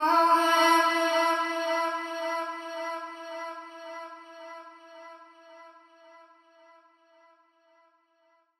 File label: 110bpm F, Vocals; Vocalpack